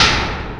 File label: Clank, Industrial; Sonic Foundry (Sony Creative Software)